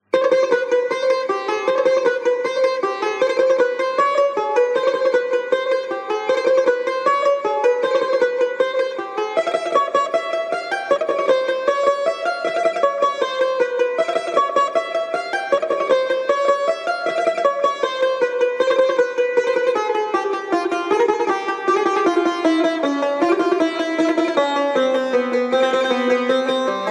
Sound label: study of chahargah tar; second book